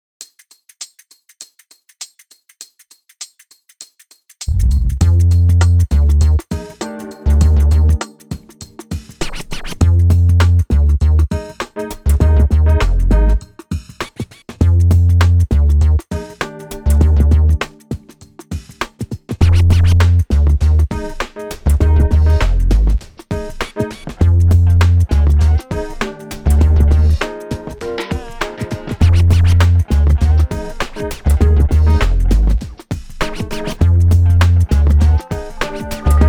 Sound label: spy groove